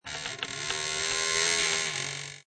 Creak Short, Short, narrow, moaning wooden creak, wood movement.; Creepy Door Sound Effects; Horror; LynneMusic